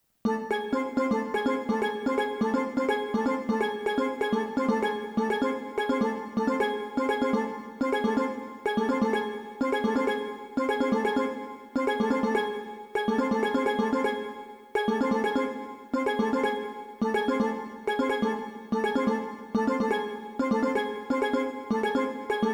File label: Rainbow Wave Flow Steel Drums